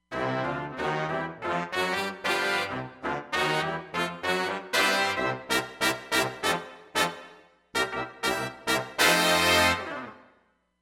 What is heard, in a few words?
Stereo Brass